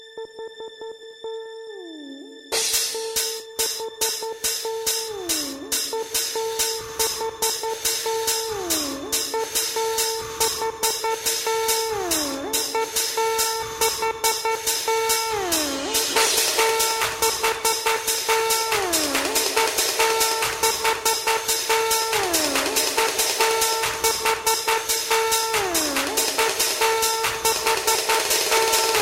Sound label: dance, electronic ringtone